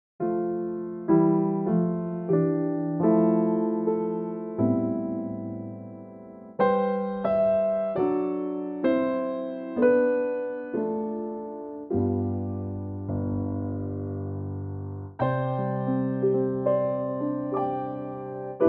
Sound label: C piano; key - C - vocal range - A to A; Gorgeous piano only arrangement of this sublime carol, no tempo in mind